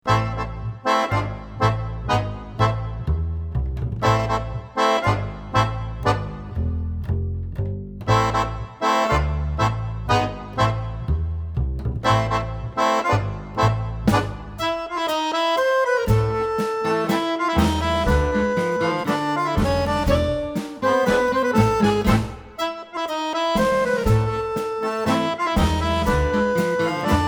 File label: Tango Gitano Akkordeon